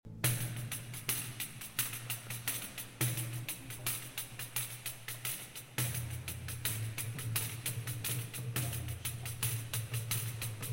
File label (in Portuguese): Maracatu e Samba